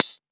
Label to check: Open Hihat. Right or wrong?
Right